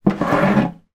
household wooden chair remove from top of wooden table